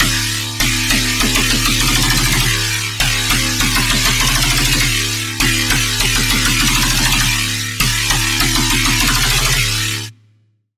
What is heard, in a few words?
Bass, Serum 2; Bass - Demon Shot; Routed Sound; Serum 2 Expansion - Chaos Bringer Vol.1